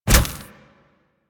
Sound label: Drill Flame Burst; Warframe; Grineer Ghouls; Ghoul Drill Sergeant; Attacks